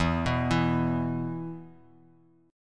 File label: E 5th E, Guitar Half Step Down